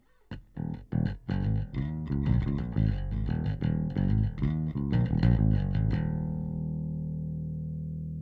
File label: bass; smart EQ 3